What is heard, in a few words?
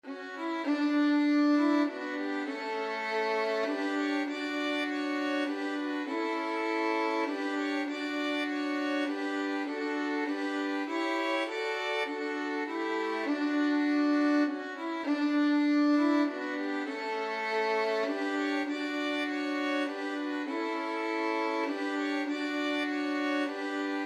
violin trio; first noel